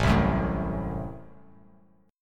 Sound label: A Minor 6th A, Chord; Am6 strummed; JGuitar